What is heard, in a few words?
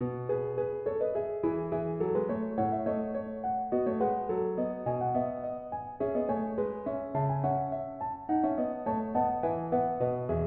bass ten; sicut locutus